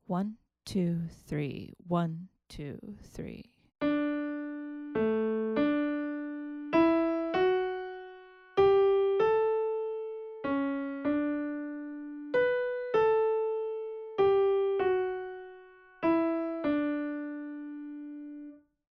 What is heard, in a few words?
melodic dictation